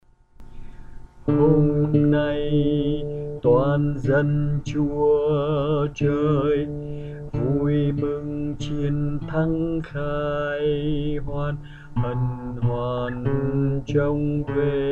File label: Alto